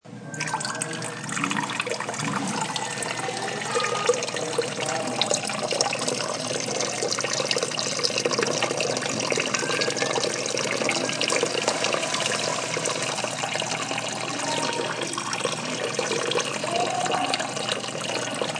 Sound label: High quality up close stereo recording of urinating into a toilet.; Human